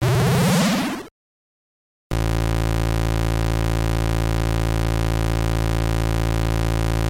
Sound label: sound effects; captain america and the avengers